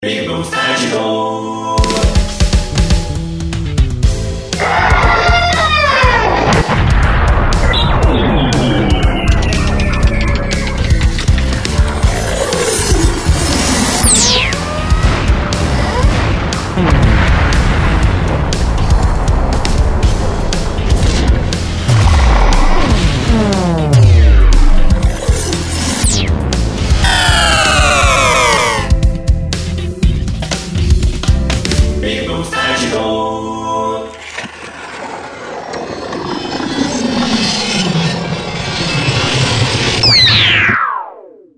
効果音素材集 戦闘武器重力; ぴぐみょん効果音素材集036; ぴぐみょんスタジオ

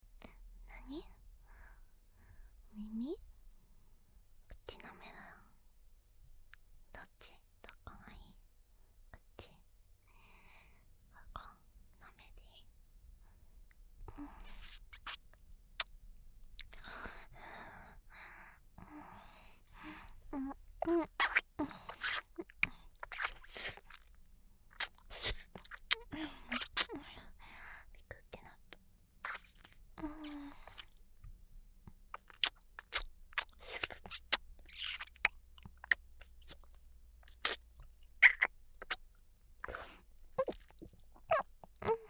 フェラ

